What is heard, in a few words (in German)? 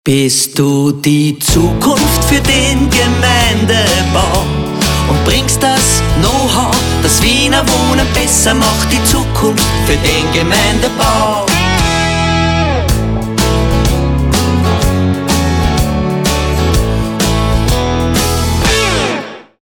Klingelton, Musik; Wiener Wohnen